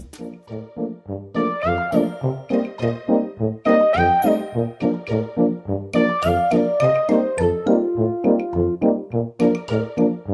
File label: music